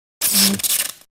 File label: Electric Sound Effect